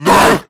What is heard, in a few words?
grunt hard, zombie vox; creatures